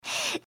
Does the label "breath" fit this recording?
Yes